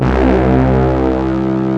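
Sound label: RICH BRASS C2 left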